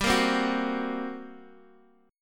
G Minor Major 7th Double Flat 5th, G, down, Guitar, Open D